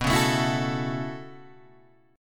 B 7th Suspended 2nd Suspended 4th down Guitar Open G, chord